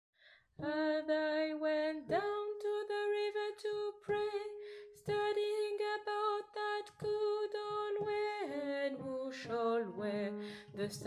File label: Down to the river (basse)